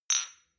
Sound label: shot glass clink bounce medium; soundpool